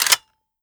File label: 96fs pull; weapons